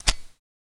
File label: Zippo Lighter Flint; lighters & matches